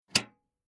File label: Tool Box Small Metal Latch Closing Locked